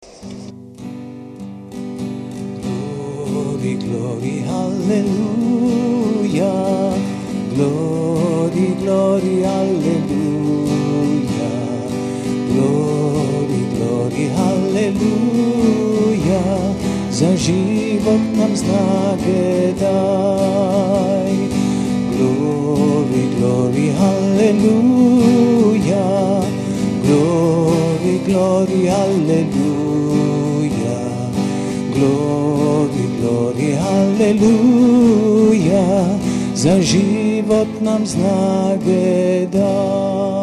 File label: glory glory aleluja; medjugorje